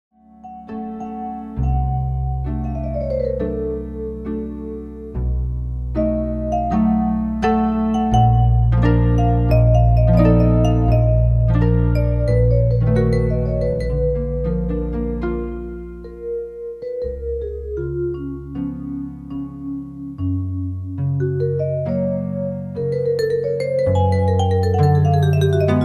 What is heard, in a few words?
nocturne in f no 2; baby einstein; disney